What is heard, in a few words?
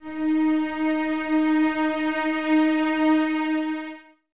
D#4, Cellos, String Ensembles; Miroslav Vitous